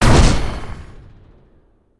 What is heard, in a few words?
attk; War3fort